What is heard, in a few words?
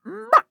Assassin Death; Realm Royale; Sly Scoundrel